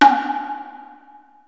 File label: tsuzumi